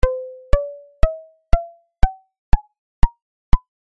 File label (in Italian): scala naturale